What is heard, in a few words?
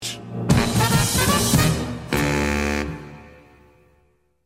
Sax